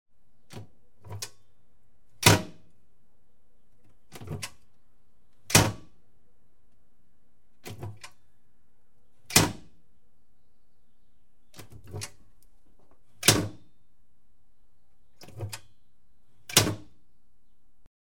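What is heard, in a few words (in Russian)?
doors of a glass cabinet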